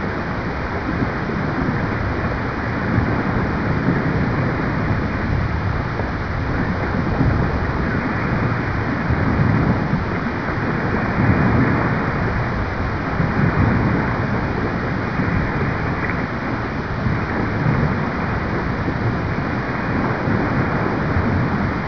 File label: waves; de pirates